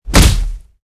hit; Chop Man

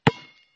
basketball floor; Looney Tunes - Recess

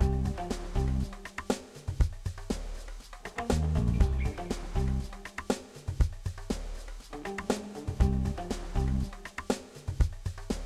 music levels loop; Ben 10 - Omnicode

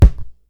ball kick shoot hard; Cartoon Network - Toon Cup 2020

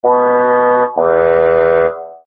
level lose; Nickelodeon - Back to School Search

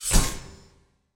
die spike; Ben 10 - Omnicode

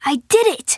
win; loudhouse normal

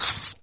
freeze snowball; Gotham City Speed

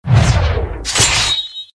logo sword; Adventure Time - Break The Worm; ThrillGames

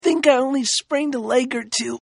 lose; casey

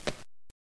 fall; Zombie Smasher

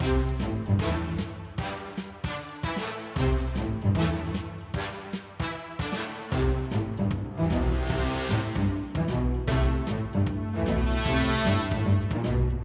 music gameplay; Grizzy & the Lemmings - Whack A Lemming

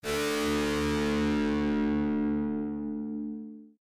fire long chord; Adventure Time - Elemental; ThrillGames

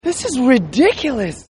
lose

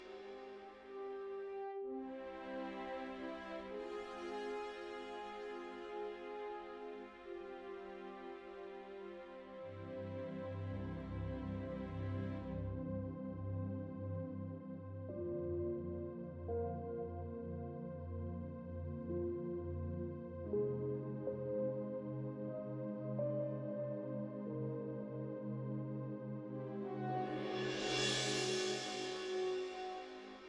bg level select; Food Farmer

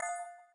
heart pickup; The Powerpuff Girls - Trail Blazer; NextlevelGames

